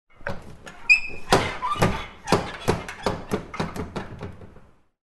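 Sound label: door sounds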